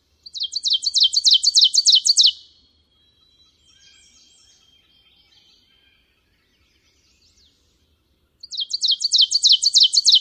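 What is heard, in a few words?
Ovenbird, songs number 2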